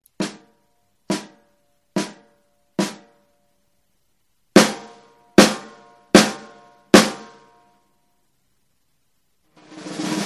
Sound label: ludwig 402 s; OLD; 70's' LM402 14X6.5